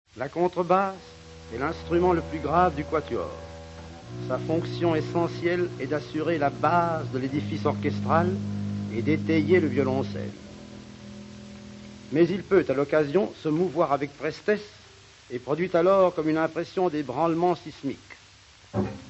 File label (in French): orch contrebasse